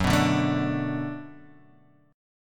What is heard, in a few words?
D 6th Gb, chord